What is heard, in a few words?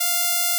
Odd Increase F5